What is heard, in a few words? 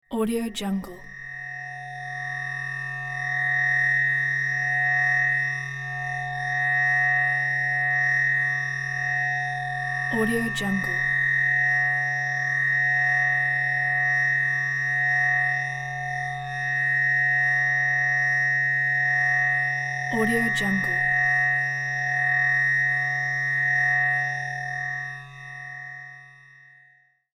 dark psycho atmosphere ambience